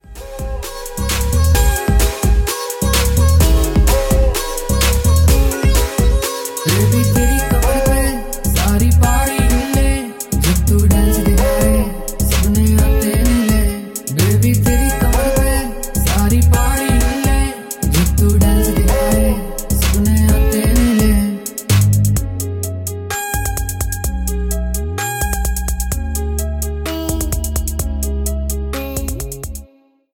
Punjabi ringtone

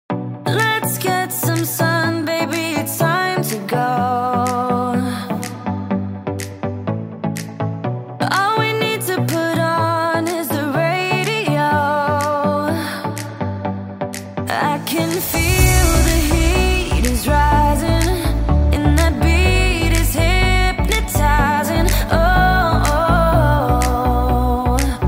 English Ringtone

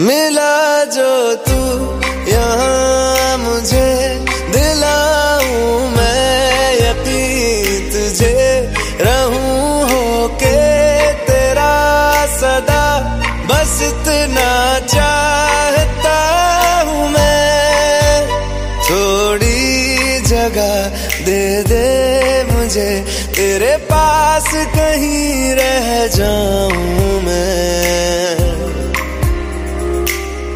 Bollywood Sad Song Ringtone

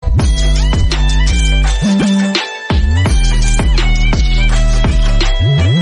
Apple Notification Tone

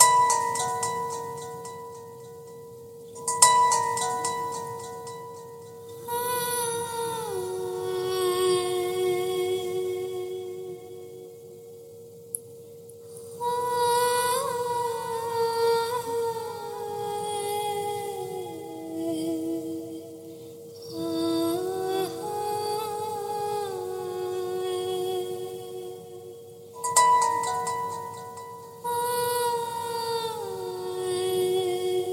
Samsung S4 Notification Song Ringtone

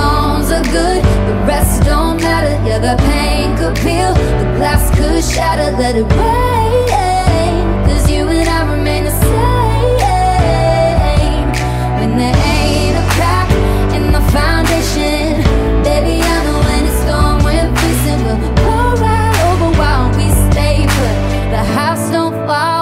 English Ringtone

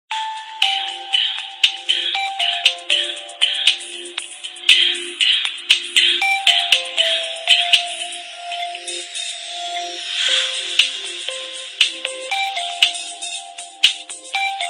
Samsung A12 Nacho Official Ringtone

